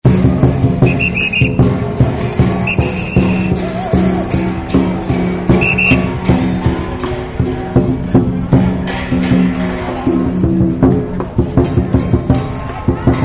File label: Chinese New Year; Holidays And Parties